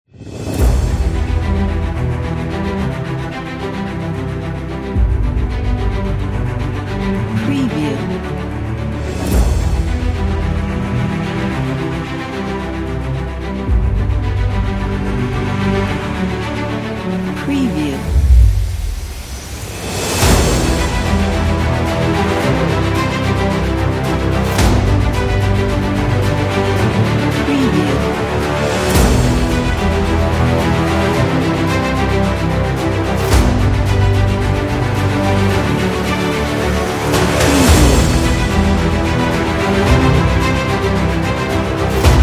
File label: epic dramatic